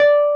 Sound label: Wurlitz EP D5; Sonic Reality - Vintage Timetraveler